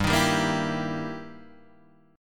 E Minor Major 7th Flat 5th, G

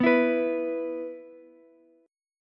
E 5th, Ukulele; strummed; chord; x,11,12,14

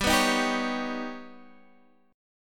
Eb Augmented 7th

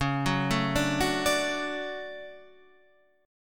D Major C#, guitar

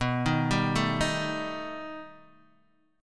B Augmented 7th B down Guitar DADGAD

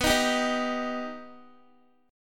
B Augmented B down Guitar; strummed

